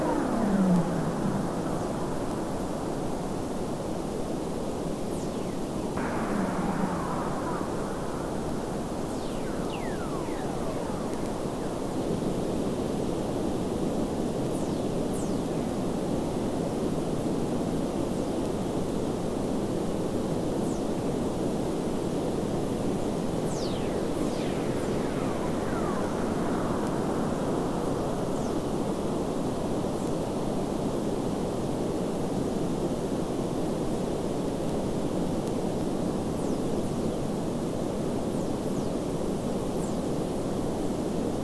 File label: WFR audio emfisis